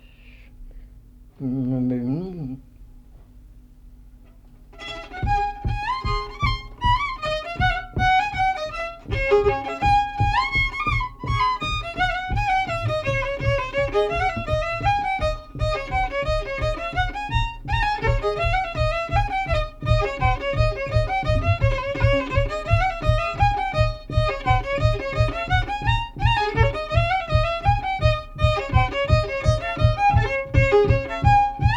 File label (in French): mazurka